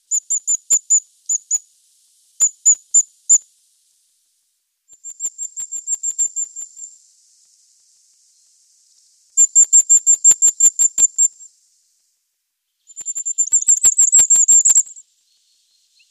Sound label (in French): Roitelet triple bandeau